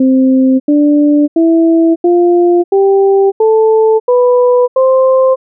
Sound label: hum; humscore